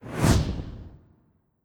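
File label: Shaman Fire swoosh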